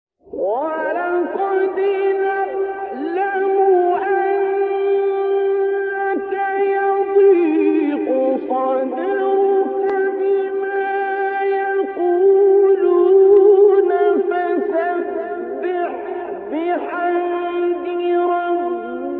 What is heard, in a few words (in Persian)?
تلاوت زیبای آیات سوره مبارکه حجر; استاد عبدالباسط; 97 99